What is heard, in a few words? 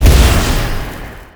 Dark plasma; GunCrasher